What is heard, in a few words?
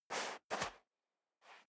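step dress boots sand scuff, footsteps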